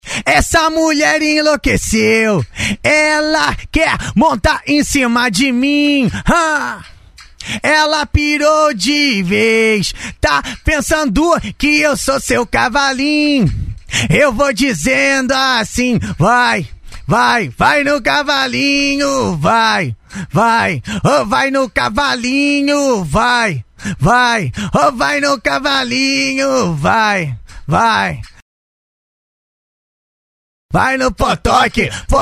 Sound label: acapella funk acapella